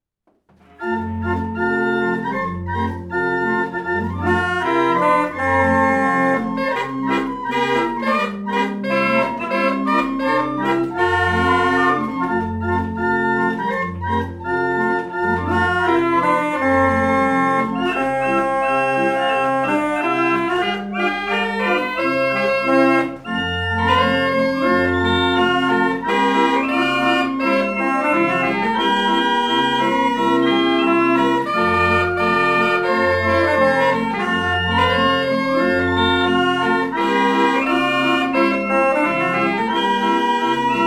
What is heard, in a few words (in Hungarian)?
kintorna hengeres hang dallamu; borenbach; nemetorszag; verkli